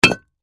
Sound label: Impact Rock Hit; Rocks; Materials; Sound Effects:Materials; Blastwave